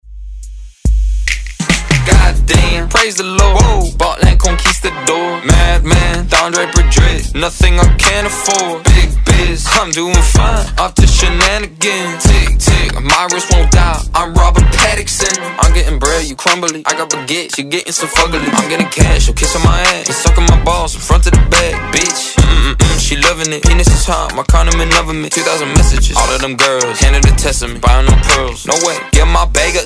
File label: Hiphop Redrum Dirty